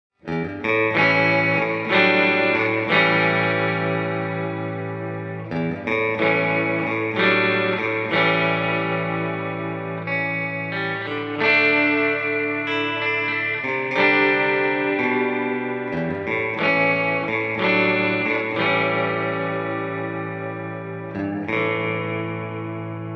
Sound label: Bright Swamp, guitars